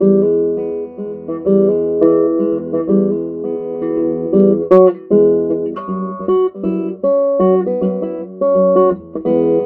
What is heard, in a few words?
guitar banjo mic